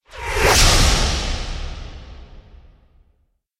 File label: magical slice; soundscrate